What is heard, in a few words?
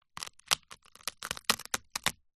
crackers squash crack break crush